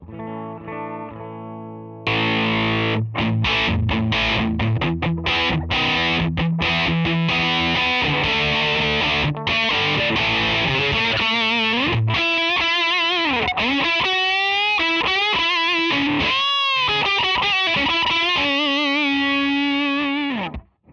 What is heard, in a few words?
Brownie Open; GFS Effects